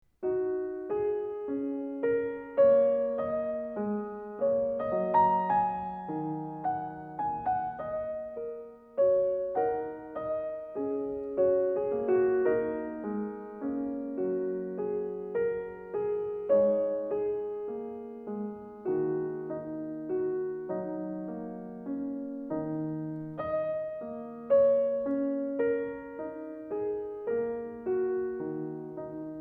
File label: Andante; Edwards; piano